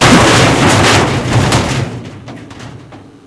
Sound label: break metal